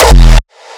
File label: Raw Kick, hardstyle; raw hardstyle kick pack 1